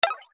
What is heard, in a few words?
win